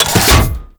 Door Close A; Warframe; Ambience; Grineer; Grn Door

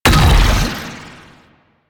Octopede Doom Pulse; Warframe; Man In The Wall

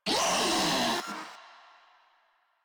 Infested Ancient Player Spotted; Warframe; Proto Infested

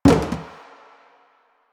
Plastic Hit Short

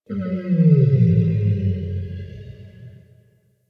Forest Distant Creature Medium Cry; Ambience; Warframe; Grineer Forest

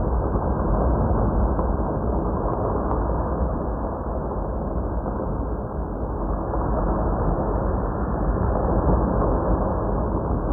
Soul Plane Amb Loop; Ambience

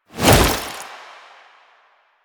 Gap Close Jump; Lasrian Butcher; Warframe; Lasrian Soldiers; Foley; Stance

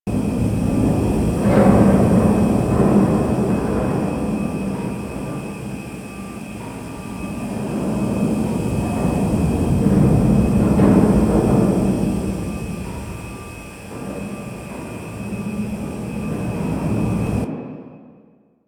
Loading Tube Ambience Loop; Warframe; The New War; Zariman

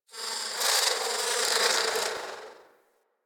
Garden Fly; Warframe; Ambience; Clan Dojo; Dojo Garden Animals

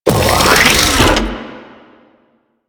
exploding arm charge; Warframe; Man In The Wall

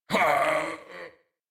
Death, en; Warframe; New War; Teshin

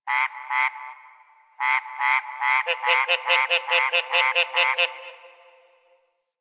Night Tree Critters; Ambience; One Offs; Warframe; Eidolon Plains

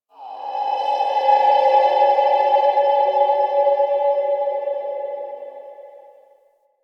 Mid Texture

